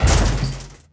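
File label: metal rattle Hit medium; objects; Star Wars Obi-Wan; XBOX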